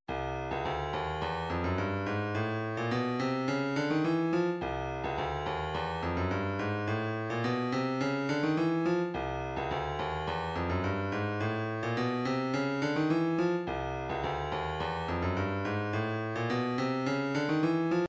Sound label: snare